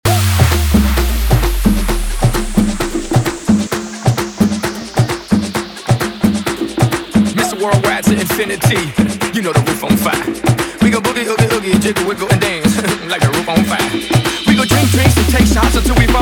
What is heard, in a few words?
fireball intro outro 131 bpm